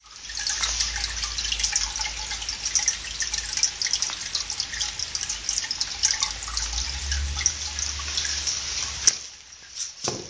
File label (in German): Wasser